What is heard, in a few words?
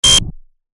swipe card